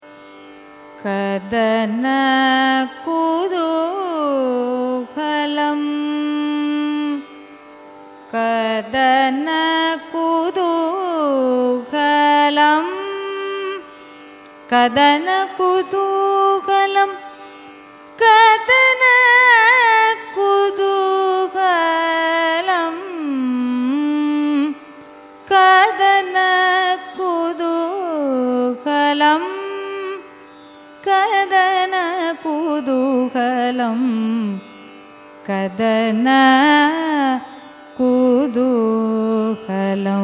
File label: raga kadanakuthoohalam signature; carnatic music